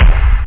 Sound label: SP12BASS; Scream Tracker Sample; 8,000 sample rate